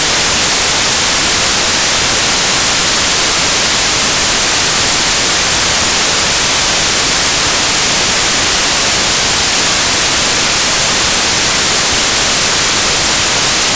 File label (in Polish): szum